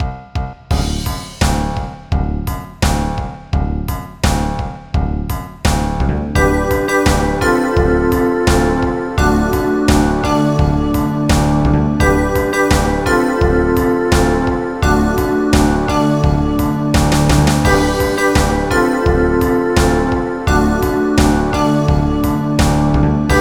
techno; World of Sound

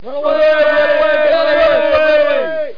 IMP DEAD

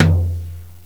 surdo open, drums; tg300b; gmplay

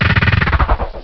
drill

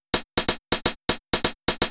HIHAT DISCO 125; Drum

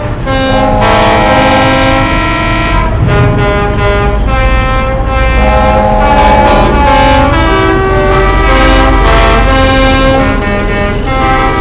traffic jam, vehicles

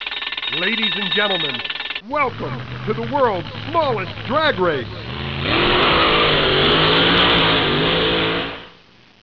Drags; T.J. Finds a Friend

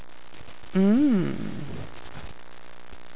mmm; The SoundBlaster Multi Media Experience vol 4

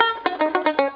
button

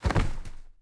body fall; The Sum of All Fears

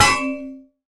metal hit; Cross Racing Championship

